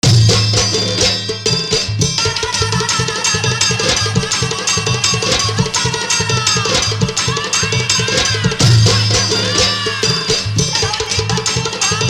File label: Old is Gold Holi Remix Song Hard Dholki Bass